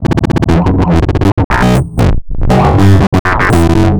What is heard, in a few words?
Processed Electric Bass